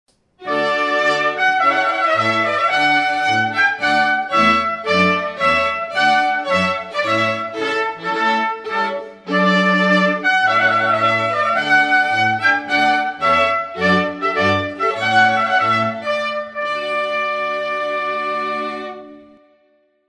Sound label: Trumpet Voluntary